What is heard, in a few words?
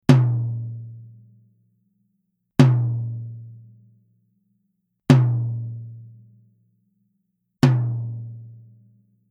TOM Onyx Hard; evans; naciagi do tomow uderzany